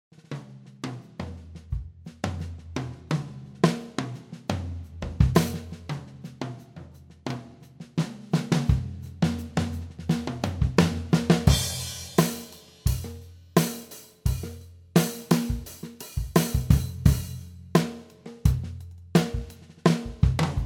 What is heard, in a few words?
Garage Rock Kit